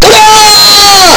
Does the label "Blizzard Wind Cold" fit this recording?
No